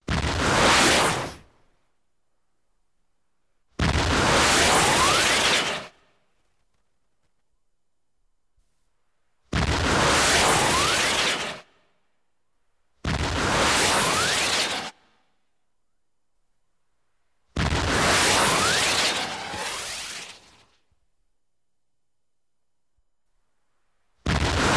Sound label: Blast Off Whoosh Lo